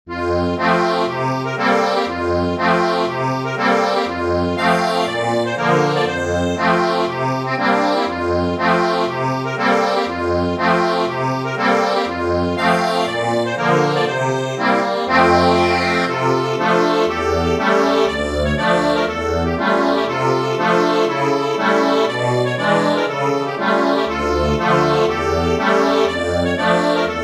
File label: accordion, sheet music